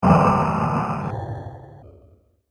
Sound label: The Fire Winds Of Hell, scary soundscape; horror sound effect; scary videogames, and sinister halloween radio, tv, programmes